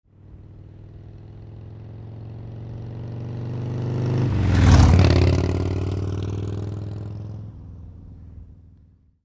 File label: Harley drive by